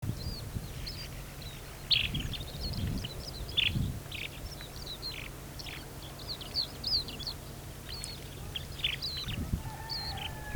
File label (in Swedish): Spovsnäppa